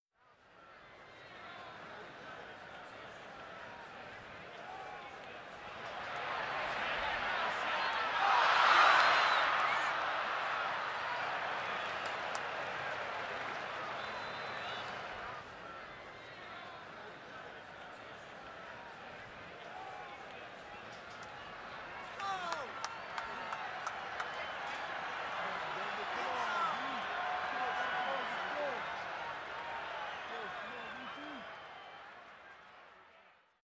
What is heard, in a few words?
Football Crowd Idle, sports arena football